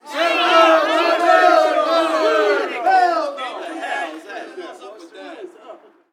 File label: street ball crowd boo; Crowds